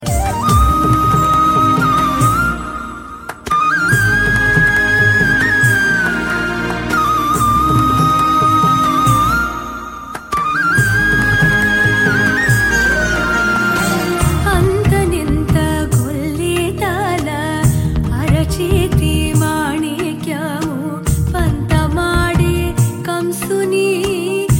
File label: devotional tone; Telugu; spiritual song